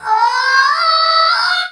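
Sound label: vocal, Drum & Bass, 140 BPM; Ueberschall; Drum & Bass Flash Trackz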